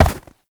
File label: Step Snow, Movement; Uriel; Warframe